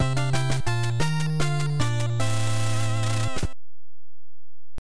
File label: Pattern Clear; quarth family computer